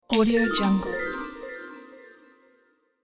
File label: treasure gold revealed